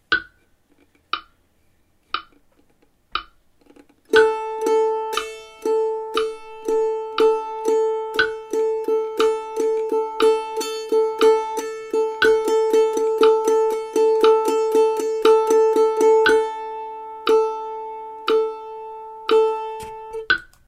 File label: rhythm; I have added triplets to this exercise